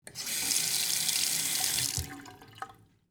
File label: faucet